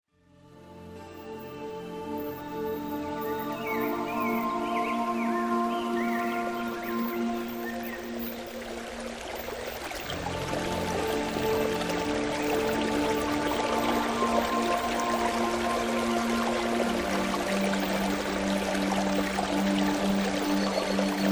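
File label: subliminal only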